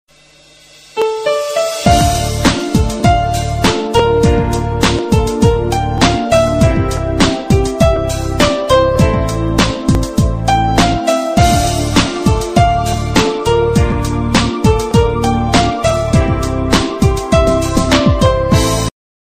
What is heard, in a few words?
Piano Remix